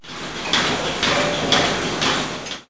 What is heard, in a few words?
Milking Machine; Farm Life